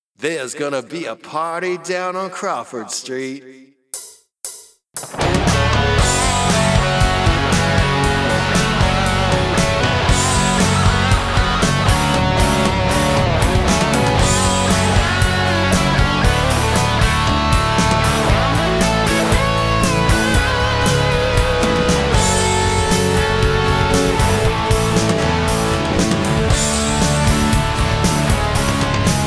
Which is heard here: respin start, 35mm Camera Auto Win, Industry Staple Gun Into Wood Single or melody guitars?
melody guitars